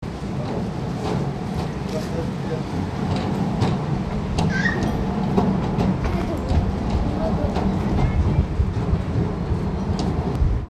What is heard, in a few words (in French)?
sous la passerelle